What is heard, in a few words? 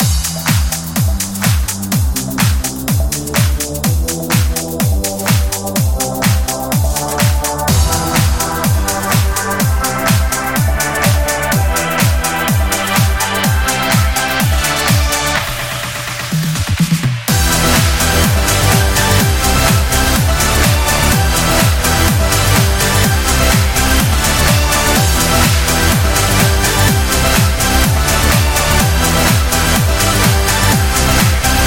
Pop, corporate music; corporate music vol 19